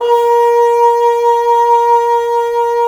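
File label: WND BN, wind bassoon; LA Composer vol. 3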